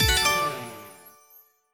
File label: All Win Animation; slots; St Paddys Day Blast